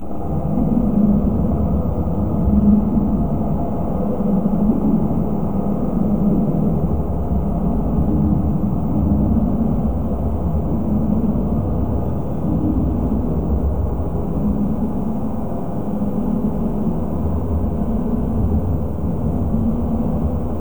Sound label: alien, Rumbles